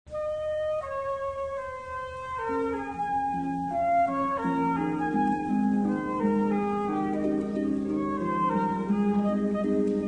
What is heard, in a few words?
waltz harp flute